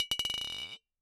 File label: Bottle Struck